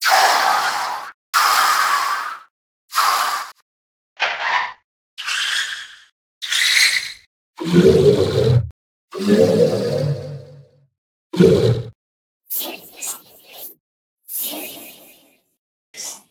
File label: enemy sounds